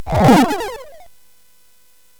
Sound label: SFX; Narco Police; hvsc